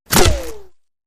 Bullet Impacts Wood I

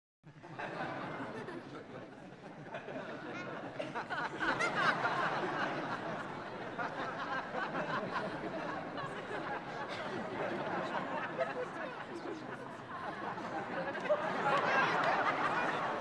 Crowd 100 Laughter; medium int

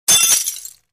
Bullet Impact Glass D

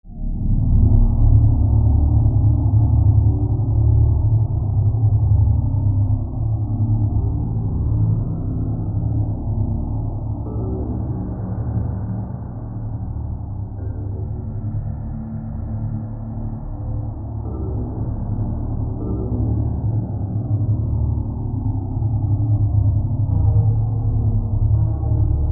Music Eerie Low, pads

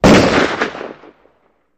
Large Guns Fire Close

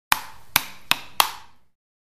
Ping Pong Ball Paddl

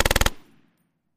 M240 Golf

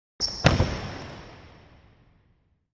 Racquetball; Body Runs Into Wall

